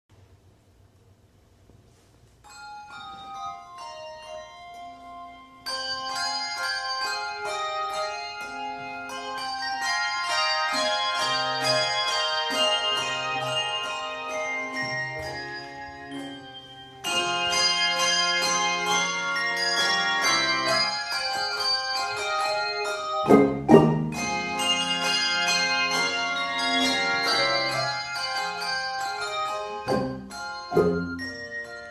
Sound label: Fantasy No 1 in F Minor, handbells